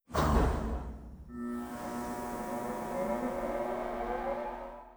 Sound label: fungoid spaceport cylinders; ambient; Stellaris